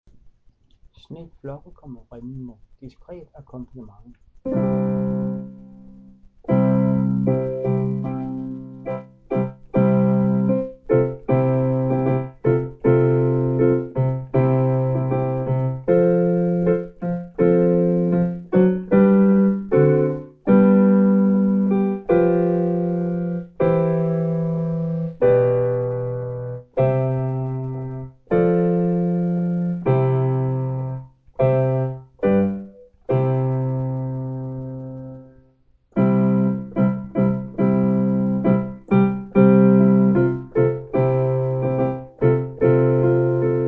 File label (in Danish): akkompagnement